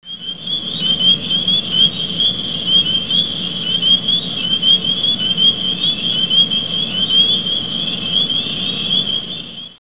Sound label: spring peeper; frogs toads; wildlife